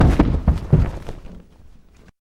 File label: body drop; foley